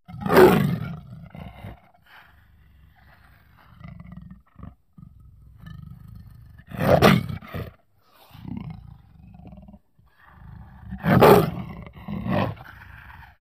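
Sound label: Leopard Roar Low Ferocious; big cats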